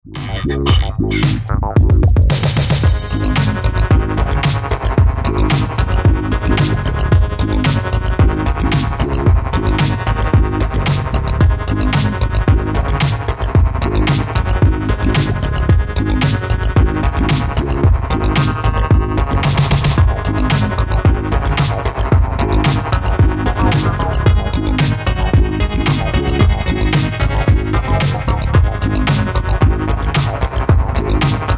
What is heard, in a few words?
funk